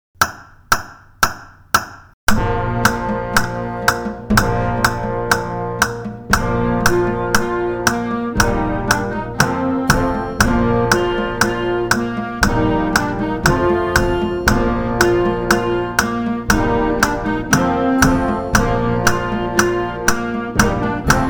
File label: Practice Tracks with clicks